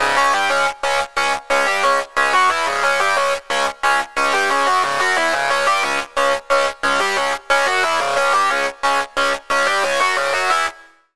Lead, Sylenth1

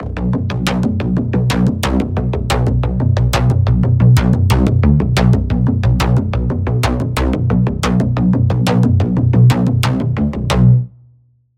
Pulse

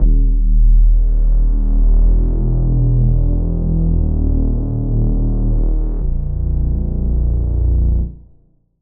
Reese; Routed Sound; Spire Expansion - Street Vanquisher Vol.1

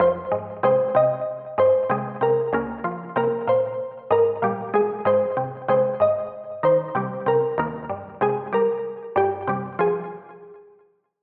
Pluck - Nobody; Routed Sound; Vital Expansion - Street Vanquisher Vol.1

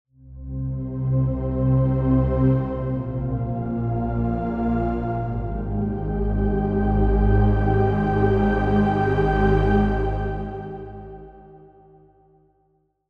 Pad; Routed Sound; Sylenth1 Expansion - Star Scavenger Vol.1

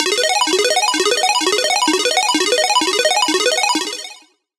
SFX - Flickering Star; Routed Sound; Serum 2 Expansion - Hyper Gamer Vol.1

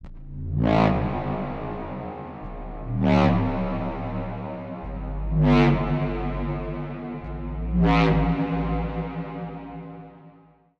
SFX - The Switch; Routed Sound; Vital Expansion - Doom Carpenter Vol.1

